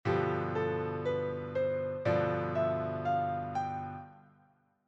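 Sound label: mixolydian G, gamme majeure; improvisation jazz; Gamme Majeure et Modes Associés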